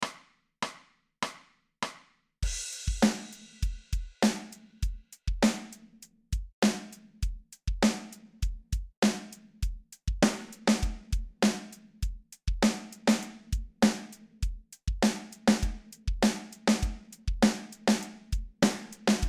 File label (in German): SOLO mit Einzaehlen 100 bpm, drumsolos